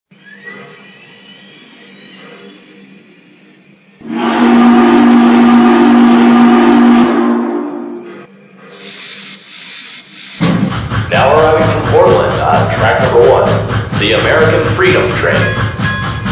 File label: 484 GS4, trains